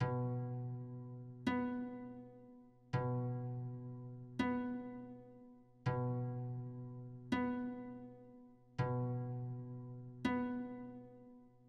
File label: 164 BPM F# Min Harp; Loop Stems; Cymatics; Cymatics Gems Vol 12 - Duel